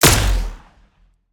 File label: WEAPONS SHOT, SCI-FI; PMSFX; Foundation Series SCI-FI vol 2